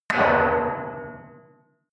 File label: metal impact bar bell large